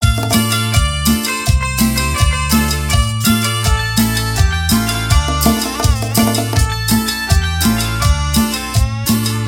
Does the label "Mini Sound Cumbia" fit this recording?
Yes